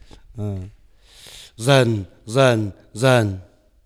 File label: drum